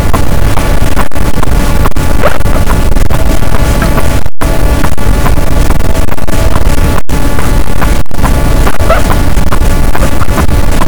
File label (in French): cachalot, boat; Physeter Macrocephalus